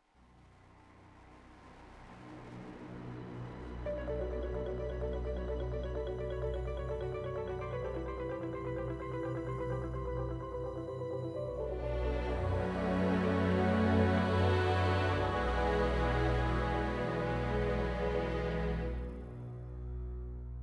Strange Drone; Astral Chain

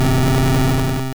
Explosion; Pokemon; Gen 1; attack moves; RBY

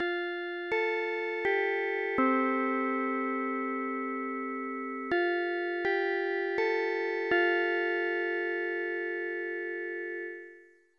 Jingle (School Chime); YU-NO A Girl Who Chants Love at the Bound of This World

